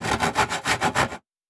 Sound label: Saw Wood, Crafting; Survival Game Sounds - Lite Edition